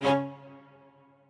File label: strings